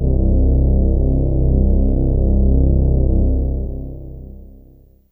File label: Ensemble C2, Fairlight CMI IIe Analog